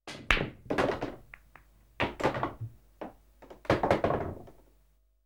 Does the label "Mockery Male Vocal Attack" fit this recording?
No